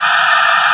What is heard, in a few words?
SPACE NOISE; EFFECTS; Best Service Dream Experience; SF+EFFECTS